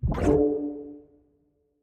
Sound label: Training Ring Player Through; Sports